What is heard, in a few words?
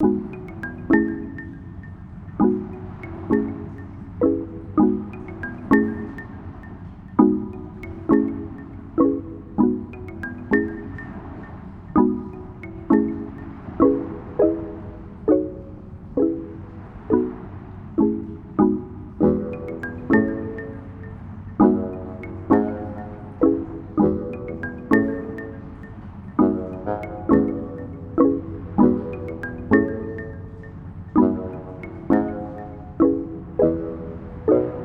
Calm; Ittle Dew 2 OST; Beach Tent